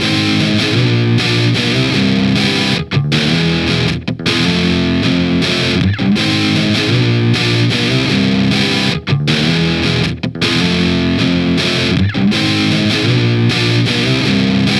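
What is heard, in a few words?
grungy guitar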